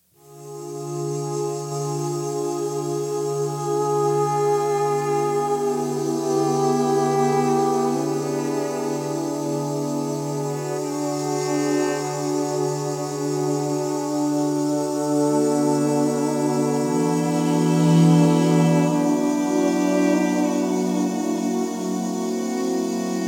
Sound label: Melancholy Meandering